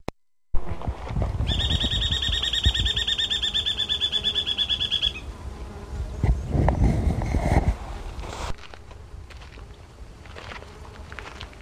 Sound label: black necked woodpecker